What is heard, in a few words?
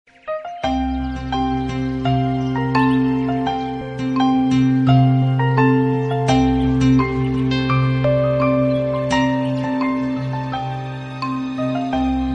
refreshing morning breeze mobile tone ringtone